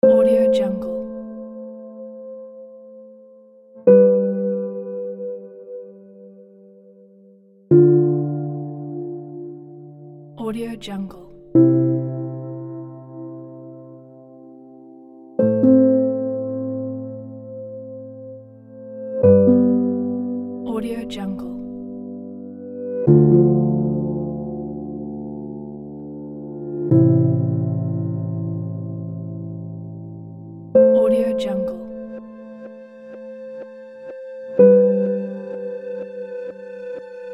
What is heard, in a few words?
emotional cinematic piano